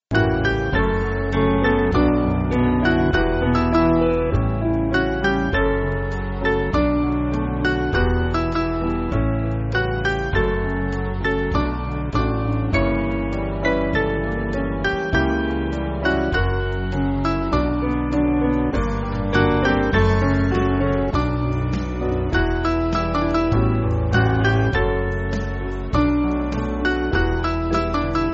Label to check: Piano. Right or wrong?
Right